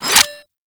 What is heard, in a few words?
Hack Minigame Transfer; Warframe; Entrati